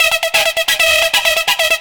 Synth Chords; Eclectic Electronica

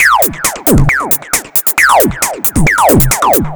Lazer Face 135BPM, sci-fi Beats

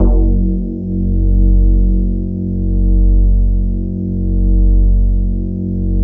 E-HARP, DX HARP; Wizoo - Powered DX

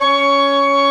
ORGN, CHURCH ORGAN; Roland - LA Composer vol. 1

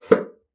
BASSOON; Xsample VOL-6

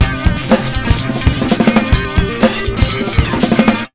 beat, Electro 125, Big Beat Drumloops; USB Soundscan vol.25; AKAI

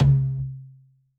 MEDIUM TOM, ELECTRO KIT

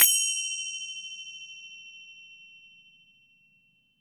FINGER CYM; AKAI S-Series CD-ROM Sound Library VOL-5

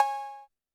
percussion, Roland TD-20

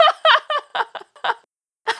laugh in time, dry, vocal; Sonic Foundry (Sony Creative Software) - Pocket Diva World Class Vocal Samples; Words

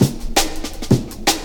Pride Cut, Drum Loops, 165.5, Jungle; Zero-G Jungle Warfare 1, 2 & 3

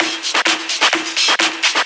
Discohouse Loop 128 BPM

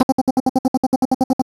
STACCATO, Anime Sound FX, SCIFI

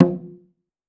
Bongo Lo, Clavia DDrum AT, Pitched Down

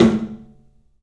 TOM, 3D Percussives; E-MU Producer Series Vol. 5 – 3-D Audio Collection; PA Toms

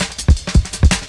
Cymbal Rise Cut, Drum Loops 165.5; Zero-G Jungle Warfare 1, 2 & 3

